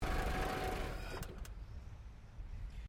CAR HOLDEN HD PREMIER BONNET OPEN SHUTDOWN, Classic Australian cars; 1965; Vehicles; SoundEffects-Foundation1